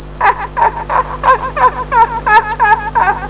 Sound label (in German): gelaechter